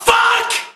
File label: Flameless Fireworks Exploding